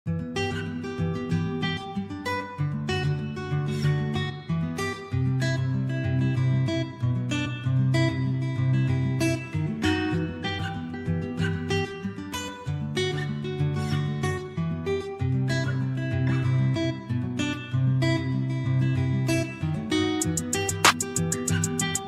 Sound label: Instrumental